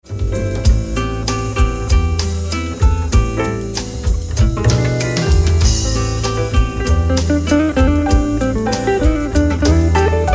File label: Bossa